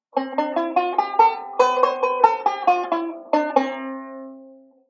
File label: Delay Scale, banjo; Beyond Chord Melody Volume II